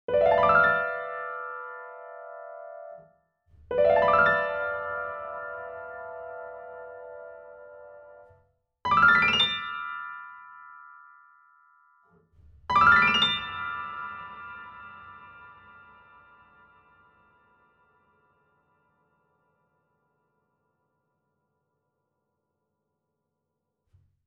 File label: sustain pedal off on